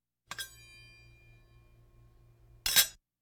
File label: Hospital effects